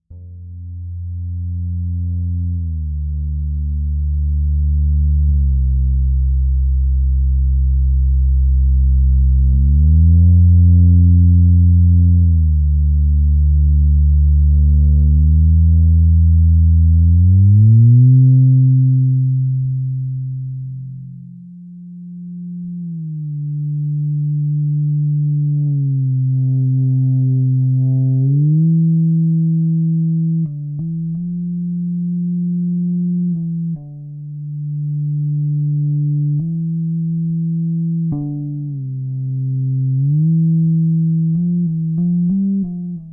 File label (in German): Fretless Ebow